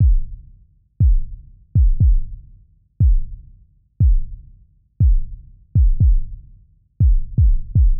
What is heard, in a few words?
drum